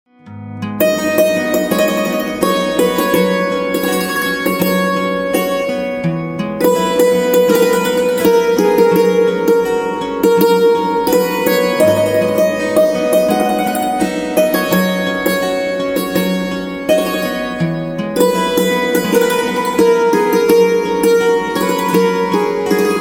Santoor

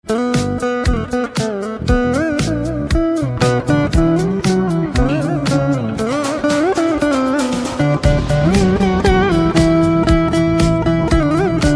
Veena, instrumental ringtone

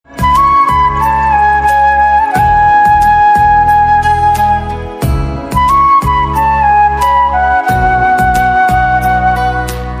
Flute Slow; instrumental ringtones

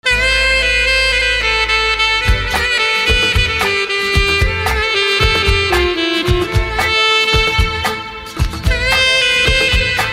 Saxophone

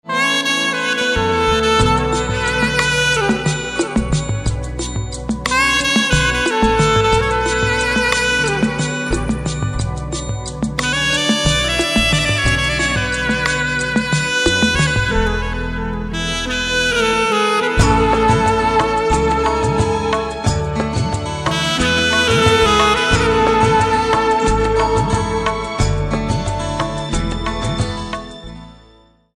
Saxophone

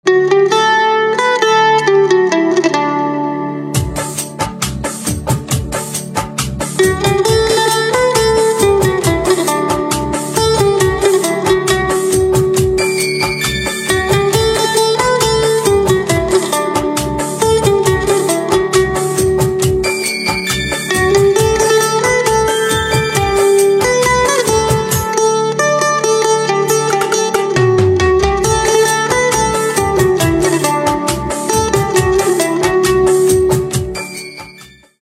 Mandolin

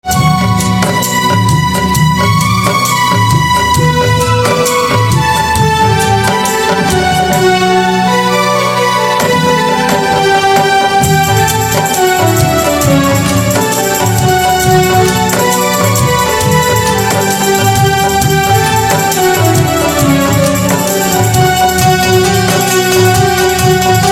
Synth Instrumental Ringtone

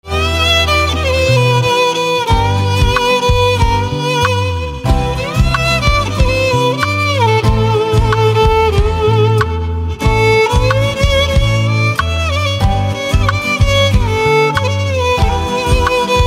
Violin

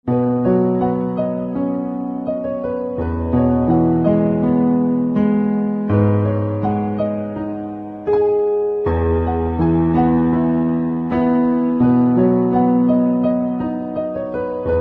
Piano Silent

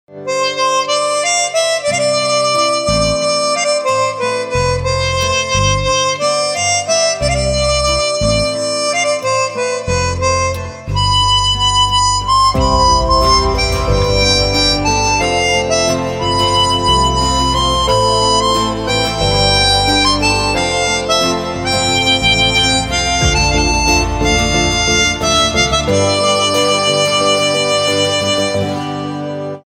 Harmonica Instrumental Ringtone

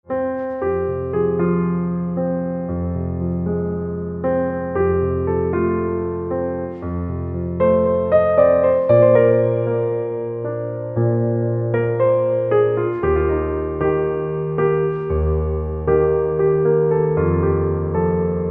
Piano Silent

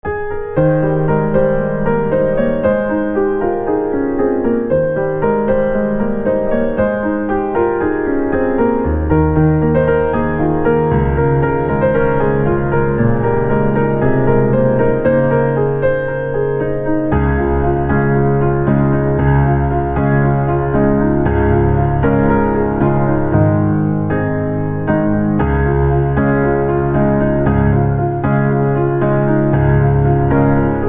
Piano Instrumental Ringtone

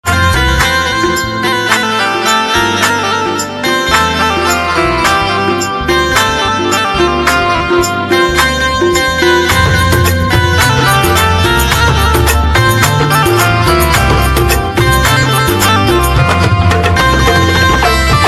Banjo

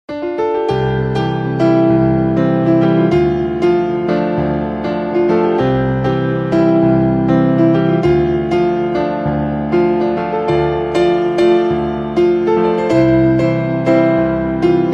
Piano, instrumental ringtones

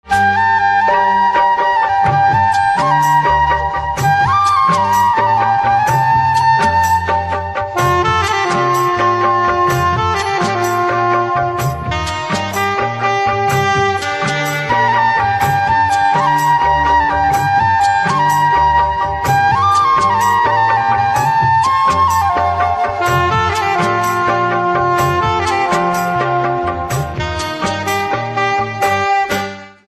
Flute with Saxophone; instrumental ringtones

